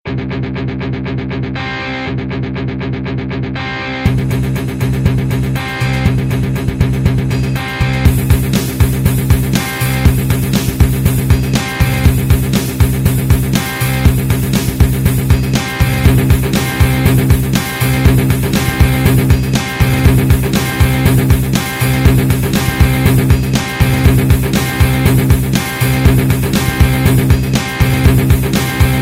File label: rocking guitar